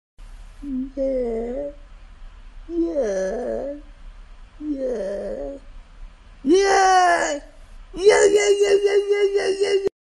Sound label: WOAH